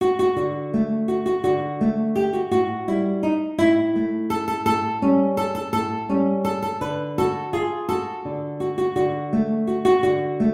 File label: Waltz, guitar; Strauss